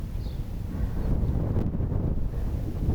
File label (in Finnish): onko tama myos mysteerilinnun aani